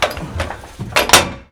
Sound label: Vehicle reloads; Red Orchestra Ostfront 41-45